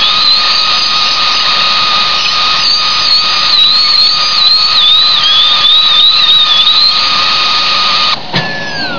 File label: turbin